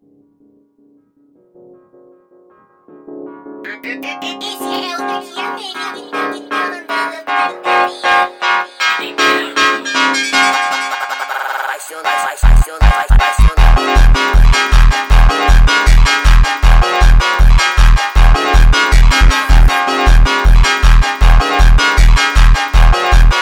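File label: sped Up, phonk music; funk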